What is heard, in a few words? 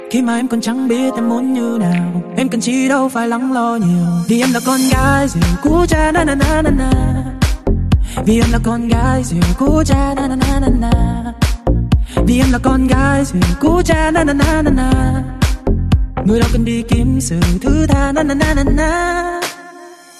Remix; nhac chuong